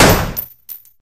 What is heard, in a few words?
combat rifle; pixel gun 3d